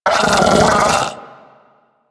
Arachnoid Vocal Attack

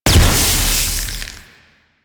Wave Ability Ice Proj By Explosion; Enemies; Abilities; Warframe; Duviri; Dax Knight

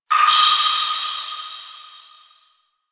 Distant Drip; Warframe; Ambience; Entrati

